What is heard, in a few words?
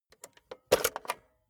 cassette player slide tray take out soft